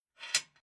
Household Old Norwegian Log Wood Burner Metal Air Vents Sliding Open